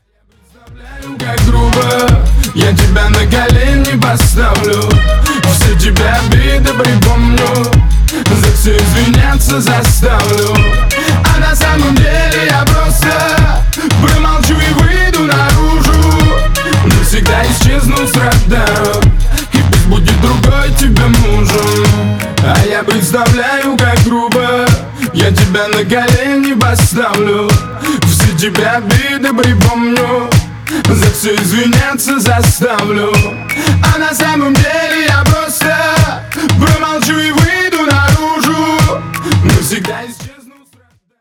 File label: BASS